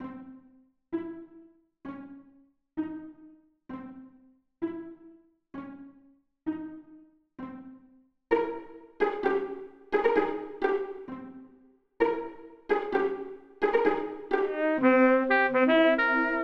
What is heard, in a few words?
sax